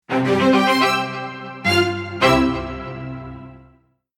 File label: jingle